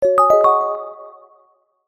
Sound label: multimedia notification mallet synth dreamy